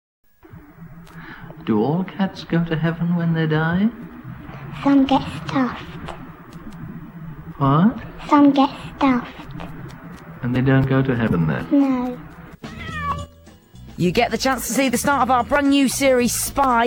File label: jingle; bbc